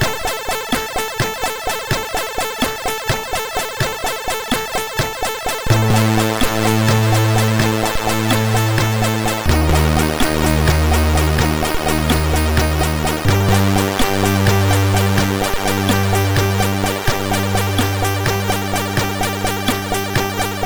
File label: MOS6581R2, sid